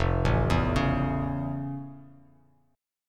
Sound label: F Augmented Major 7th, Bass Standard; arpeggiated; down